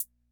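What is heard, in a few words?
closed hi-hat, classic machines; sr-120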